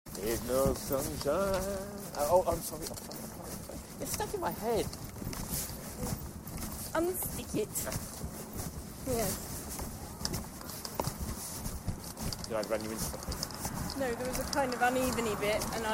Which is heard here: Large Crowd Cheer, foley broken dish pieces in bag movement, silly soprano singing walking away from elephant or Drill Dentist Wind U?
silly soprano singing walking away from elephant